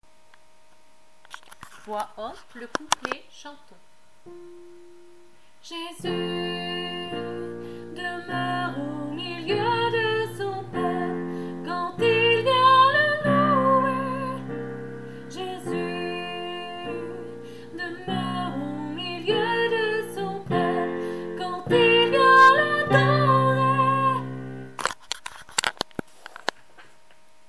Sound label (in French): chantons voix haute couplet